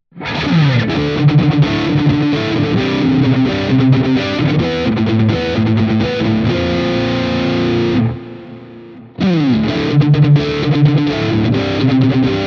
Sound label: rhythm; AZ2407F